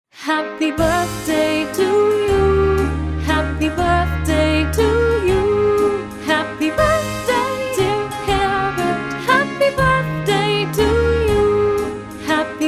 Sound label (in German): happy birthday herbert